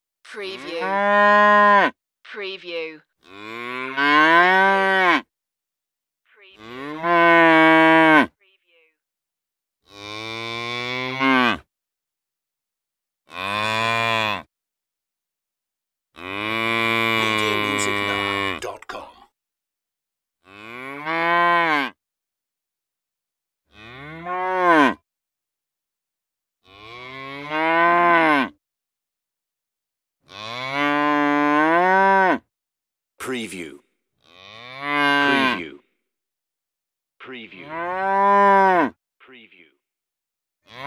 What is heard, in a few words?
cow moos